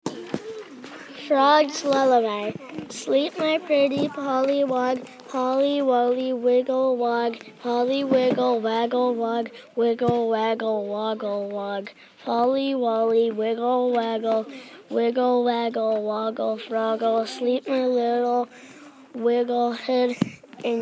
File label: frog lullaby